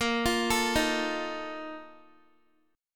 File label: Bb Major 7th Suspended 4th, Guitar; BbM7sus4 arpeggiated